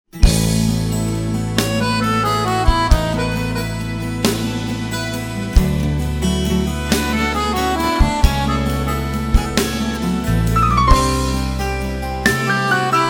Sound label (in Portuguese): toada